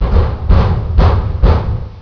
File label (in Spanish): golpes